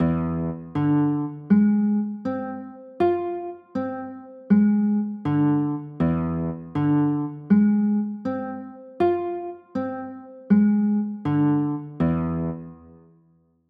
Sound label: b flat 9 sharp 11, chords